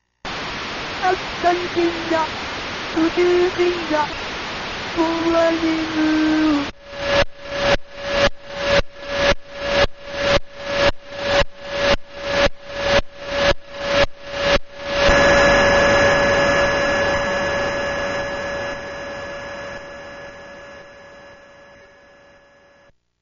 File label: Break Speaceship